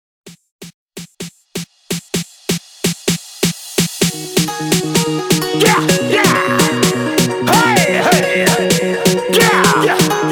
128 BPM

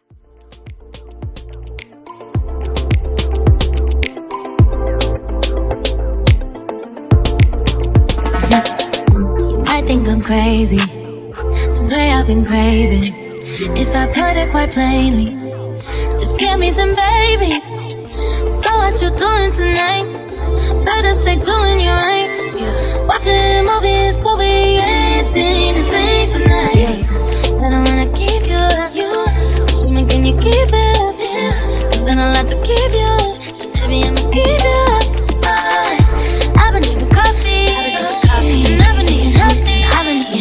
Top40 Moombahton Mashup

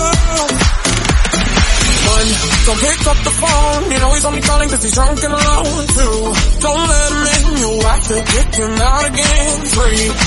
Slap House Am 125bpm

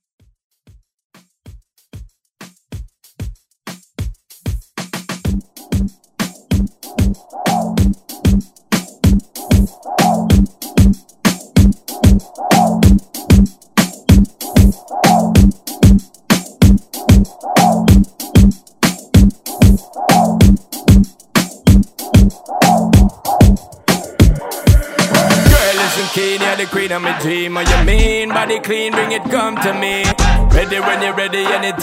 Dancehall Redrum

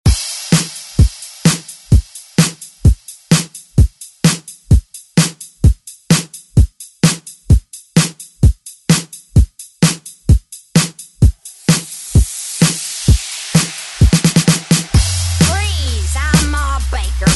2023 ReDrum

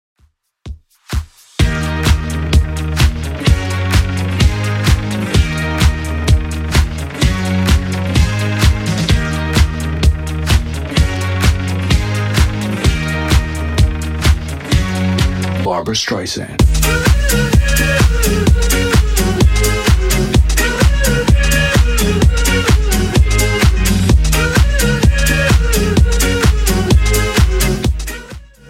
2ks DJ Friendly Redrum 128bpm

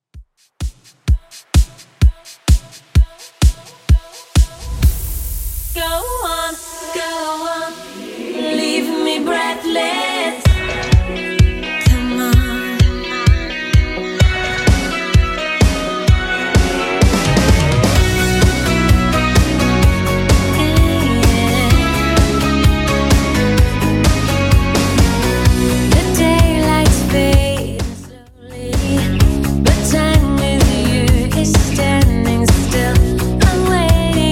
2ks 128 bpm

